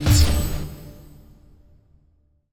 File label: Ui Reward; Forza Motorsport 6 Apex